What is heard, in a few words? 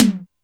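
Tom Hi Blade, Alesis D4 drum machine; 300 Drum Machines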